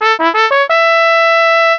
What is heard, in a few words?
trumpet; sound-icons